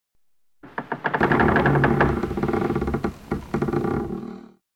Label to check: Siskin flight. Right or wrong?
Wrong